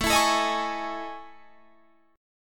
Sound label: A Major Sharp 11th